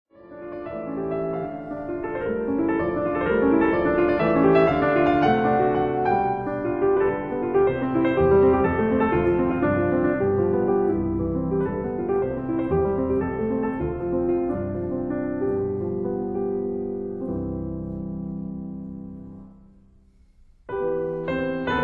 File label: Arabeske for piano in C major